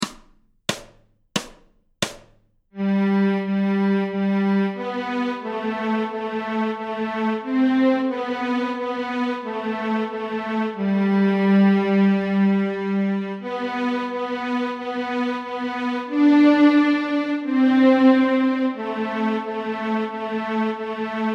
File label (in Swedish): Björnen sover Stråk G, Fiol; Notträning